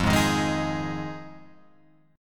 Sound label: F Minor 7th F Guitar Open F; strummed; JGuitar